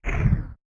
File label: Machine Charge Down; SoundsCrate